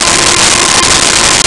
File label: Viola F4; Nintendo; July 2020 Gigaleak